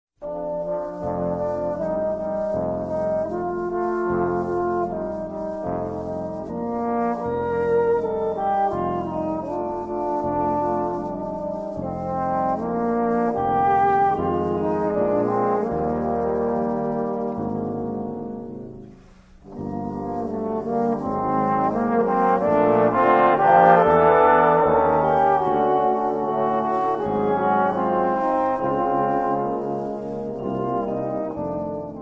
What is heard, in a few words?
xmas, 4 Trombones, Christmas Carols; Vol. 3 Christmas Carols